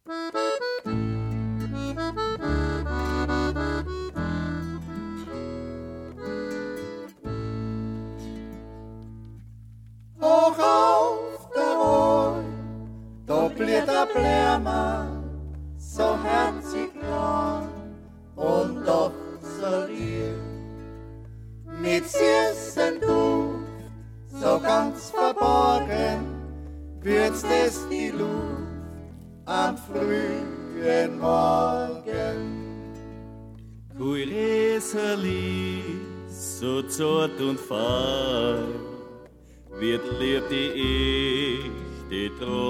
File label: Folk; Kohlroeserl